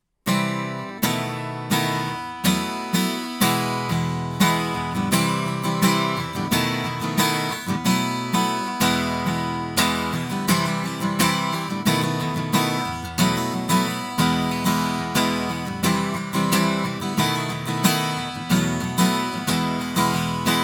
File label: Act Guit R